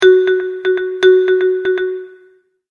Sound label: Multimedia Tone-Thinking/Processing; Tones; Sound Design; McKinney Sound